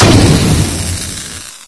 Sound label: energy explosion, scanner; video game; npc; Source Engine